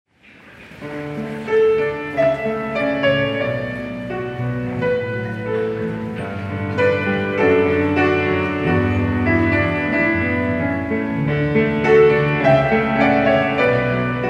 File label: Contemporary Choir, Piano